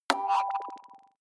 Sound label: sci-fi notification